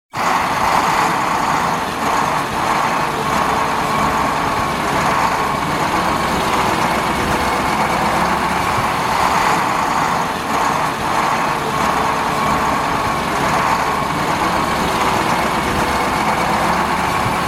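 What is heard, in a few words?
armoured personnel carrier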